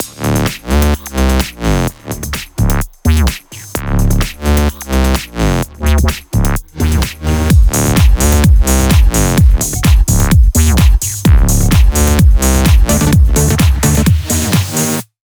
128BPM Mix; Vengeance Samples; VFH1 128BPM Technology Kit

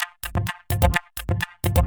Vocoder Loops 128BPM; Vengeance Samples; VVE1 Vocal Loops

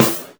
Snares; Vengeance Samples

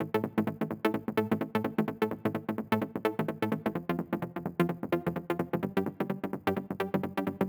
128BPM Seq Root A; Vengeance Samples; Control Kit

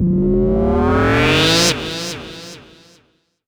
Uplifter

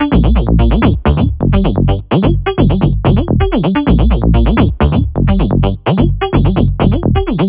128BPM Melody; Vengeance Samples; VFH1 128BPM E Samba Kit

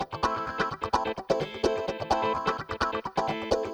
Electric Guitar, 2 A min, 128BPM; Vengeance Samples; VEH3 Electric Guitar Kit 2

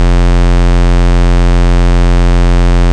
Root F, Bass

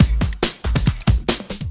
Loops BB 140, BreakBeats; Vengeance Samples

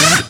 Synths D#; Vengeance essential club sounds-3

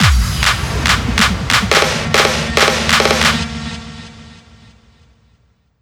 140BPM 2Bars, Hands Up Trance Fills; Lawl; Vengeance Samples

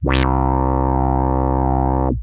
Arp D C2, ARP Odyssey, synth samples; musicradar-synth-samples

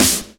snares; Vengeance Samples

